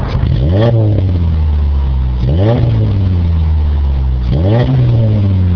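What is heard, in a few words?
2007 chevy hhr single, exhaust; Chevy/GMC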